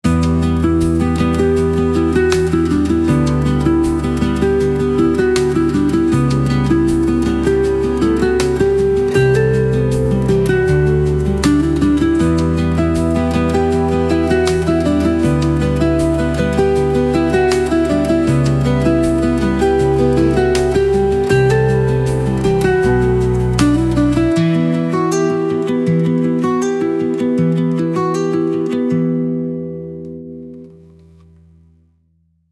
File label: 74 bpm Acoustic Guitar Bass Stings; 33 sec; ai social media music